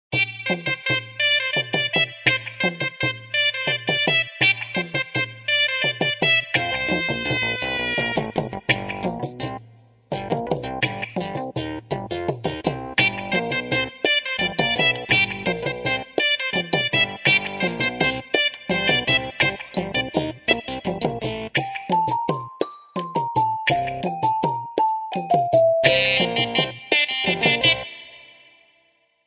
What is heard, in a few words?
Folk; Virgin Mobile India; Cheeky